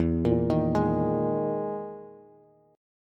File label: E Major 7th Flat 5th E, Bass; arpeggiated; JGuitar